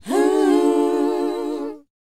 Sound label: WHOA C, Vocal R&B & Dance; ILIO; Vocal Planet